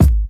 old school sharp kick sample g sharp key, kick drum sample tuned to the G# note